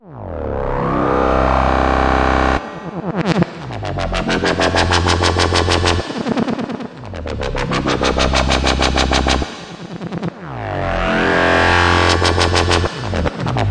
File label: wobble intro, bass loops